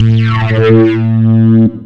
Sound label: RELEASE, TWAV BASS; Soundengine - CSR-000 The Art of Transwaves